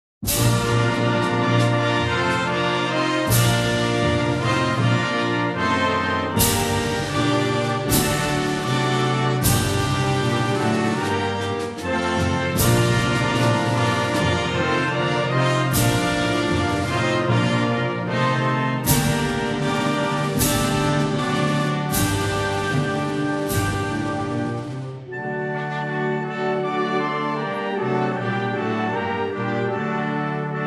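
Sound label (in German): UA Nationalhymne instrumental; Ukraine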